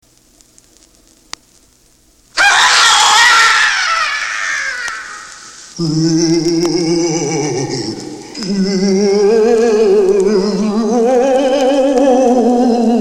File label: Screams And Groans; Disney